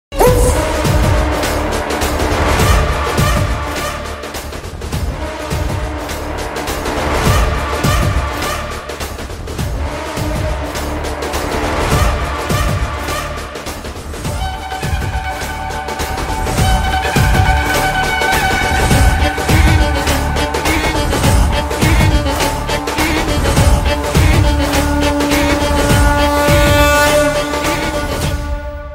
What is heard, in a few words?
wildfire bgm attitude bgm telugu bgm; pushpa 2